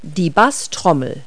bass drum

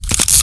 machine; Select Soft Publishing Stock Clips Multimedia Clips; buttons

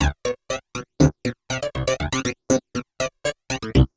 funky clav

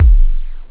tone, drums technobd

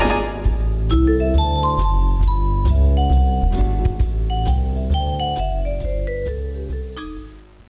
JAZZ OUT

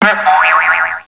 mail box; Princess and the Pea; SoftKey

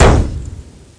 Damage Hit; Defiance

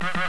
horn; Image Bonanza

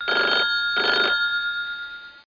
PHONE RNG

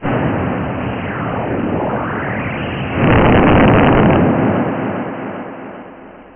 STORM

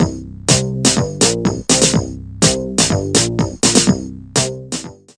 a loop

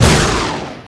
LASER; forsaken

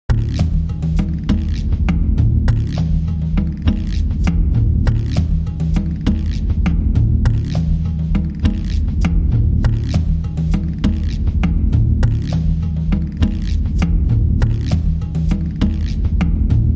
song comedy; WMBonusXP

